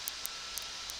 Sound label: Background Noise